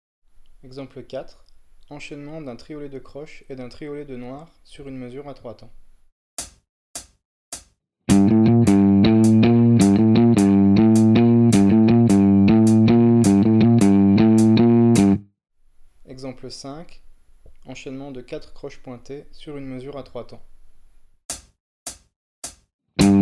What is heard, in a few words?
odd Grouping